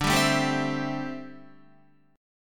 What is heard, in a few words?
D 9th Suspended 4th D down Guitar; strummed; JGuitar